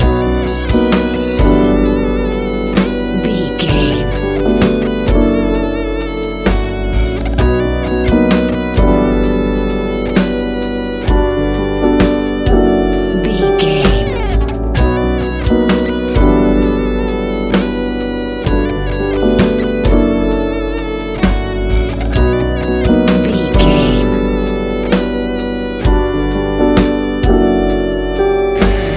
computerized neon frenzy, A relaxed and chilling piece of music